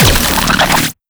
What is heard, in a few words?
electric grab, battery holder; mechanical units